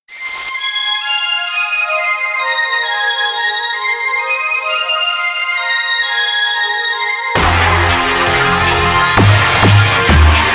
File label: Rude Synth